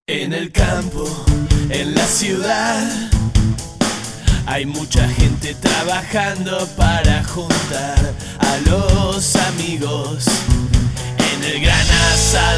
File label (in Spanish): Radio JINGLE; Comerciales radio